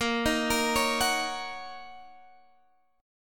D sharp Minor 7th

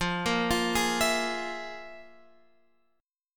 F Minor Major 7th Double Flat 5th, Guitar, Open F; FmM7bb5 arpeggiated; x,12,10,12,11,11

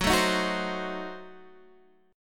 Gb Diminished 7th, down, Guitar, Standard; strummed; JGuitar

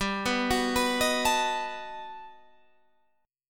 G Augmented Major 9th, Guitar, Half Step Down

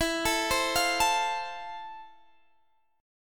F Major 7th E

